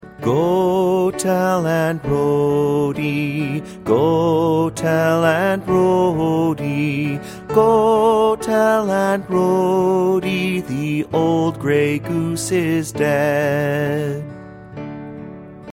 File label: go tell aunt rhody vocal, folk song